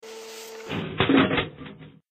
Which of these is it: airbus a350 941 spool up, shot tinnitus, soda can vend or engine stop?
soda can vend